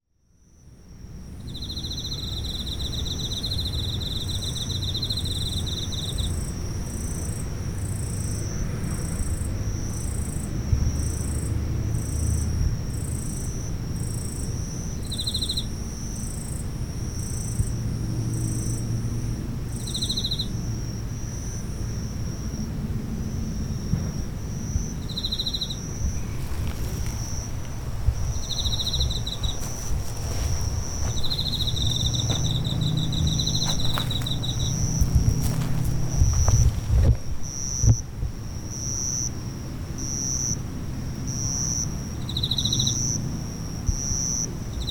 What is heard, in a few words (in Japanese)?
yoru no oto hausu no naka; Chino Cultural Complex